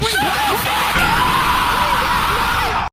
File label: hollow yell